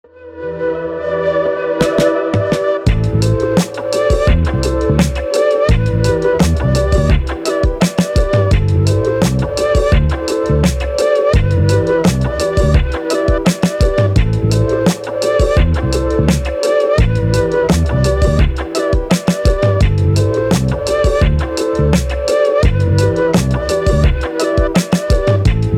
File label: Asian Funk Flute